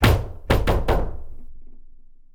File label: Knock; MiSide